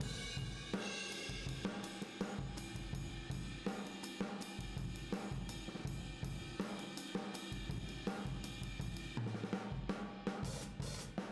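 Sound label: Boogaloo Fill Room mono, 82 BPM; Sonic Foundry; Drums from the Big Room The Mixes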